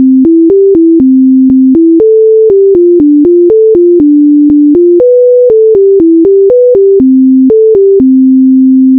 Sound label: sine